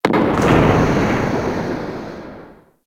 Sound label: Galleon Eerie Amb; Ambience; Warframe; Grineer Galleon